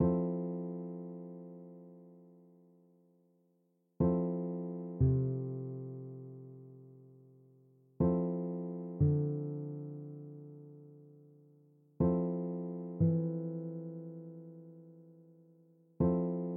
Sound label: f lydian vamp